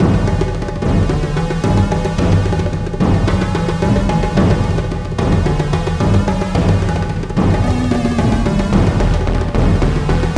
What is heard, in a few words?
Music Game Mode Selection; Dungeon League